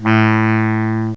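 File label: tenor sax; REEDS2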